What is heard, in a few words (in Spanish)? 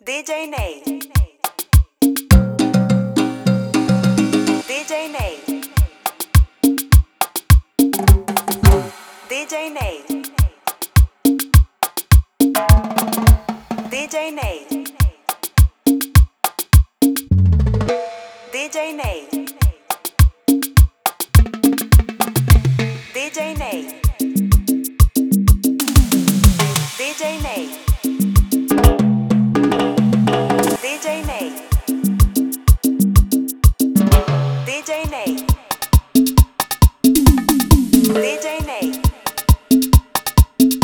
FILL CUMBIA